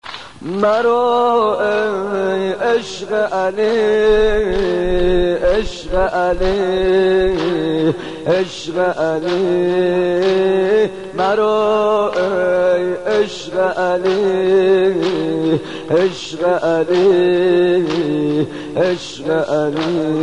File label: madahi ringtone